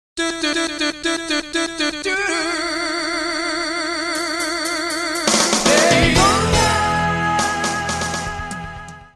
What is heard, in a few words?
music lets go away; daytona usa arc